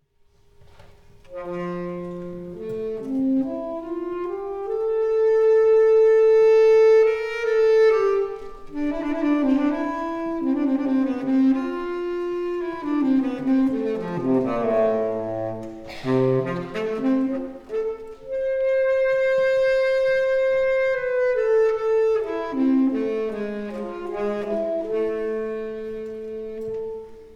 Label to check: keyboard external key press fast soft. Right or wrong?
Wrong